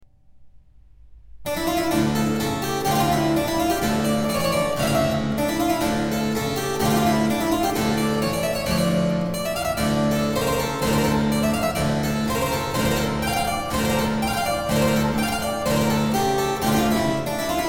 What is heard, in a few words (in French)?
Pièces De Clavecin Suite In E Minor Tambourin; 1724